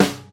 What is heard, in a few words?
Fat Snare Sound C# Key, Royality free steel snare drum sound tuned to the C# note; snare drum samples